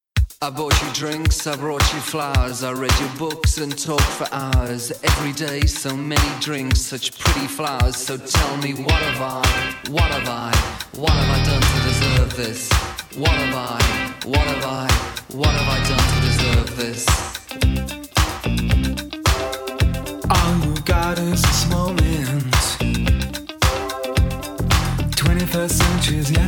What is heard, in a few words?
80s Pop